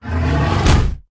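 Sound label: bear attack up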